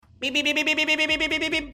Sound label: beep